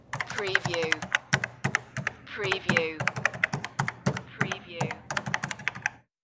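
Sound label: Cash Register Buttons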